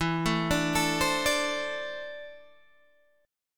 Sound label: E 7th Suspended 4th Sharp 5th, Guitar, DADGAD